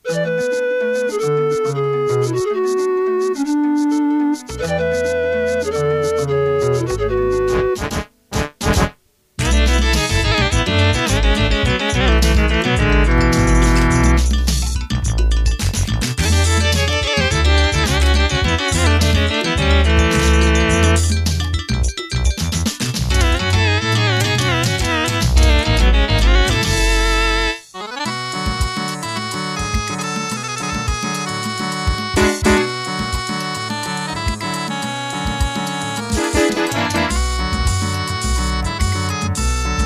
KN600; Werks Demo sx

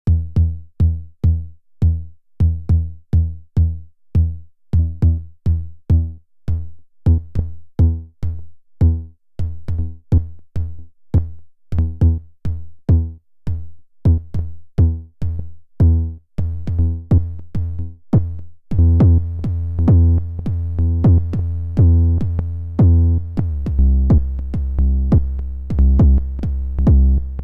KICK Drive

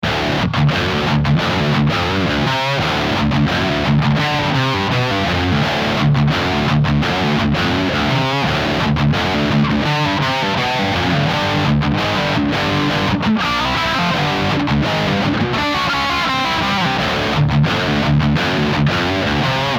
HK TM36 Highgain D.I.

